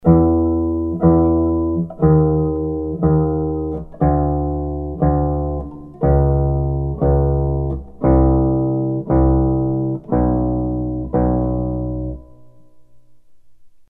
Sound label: after scale, contrabass